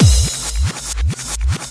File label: Electro Fills